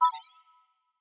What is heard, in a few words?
Ui Notification malfunction Stereo